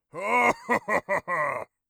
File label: laugh, orc voice; Orc Voice Pack